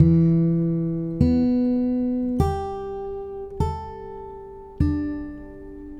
Red Trees Gtr, Guitar Only; Sonic Foundry (Sony Creative Software); Friendly Fire

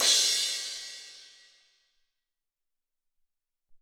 17 Crash MF, Alesis SR-18

